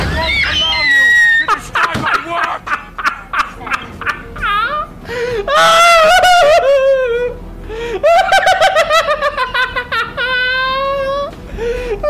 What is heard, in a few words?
heroes laugh